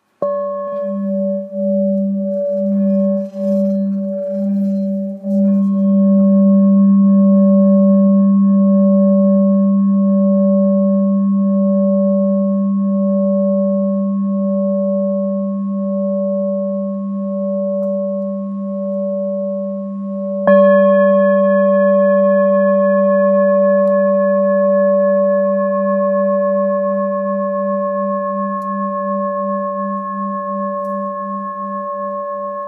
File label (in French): Bol bengale sol